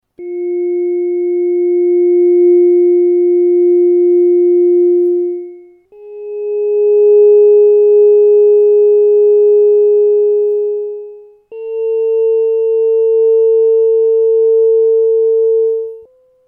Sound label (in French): set harmonique diapason fa dièse la bémol si bémol 352hz 416hz 448hz